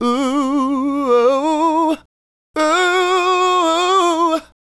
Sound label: Male Solo, Hip-Hop and RnB Vocals